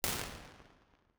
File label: Church; St Patricks Church